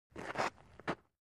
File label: Baseball FS Cleats; baseball fx & games